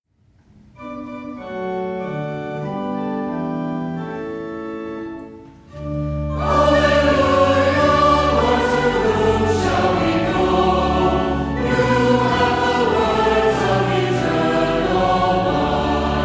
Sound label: Alleluia and Verse; Common; LSB 156